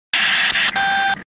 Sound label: alert sound; ace combat 6; awacs